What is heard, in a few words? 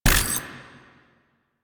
Pod Shotgun Reload; Weapons; DE; Warframe; Tenno